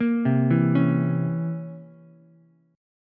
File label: C 7th Flat 9th C, Ukulele; C7b9 arpeggiated; JGuitar